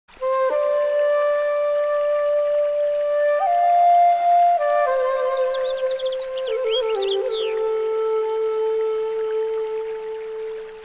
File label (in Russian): japonskaja muzyka zvuki prirody i flejta